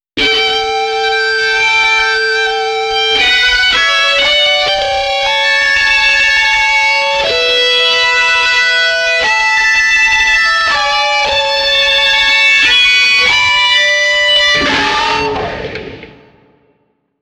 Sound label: Wrong Violins